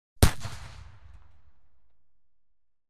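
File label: Gelignite explosion 20 feet; The BBC Sound Effects Library; Bang!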